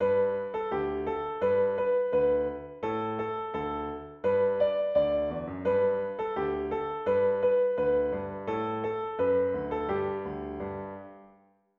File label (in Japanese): ピアノ